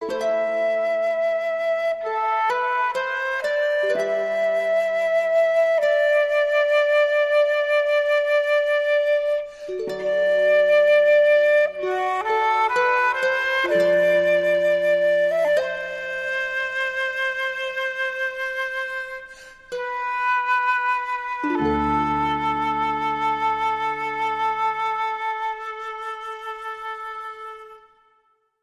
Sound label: Harp & Flute